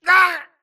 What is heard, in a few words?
grunt death quick; Titanfall 2